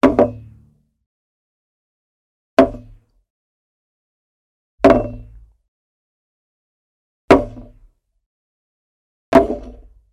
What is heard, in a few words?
household cup place down on table 5 times; sound effects one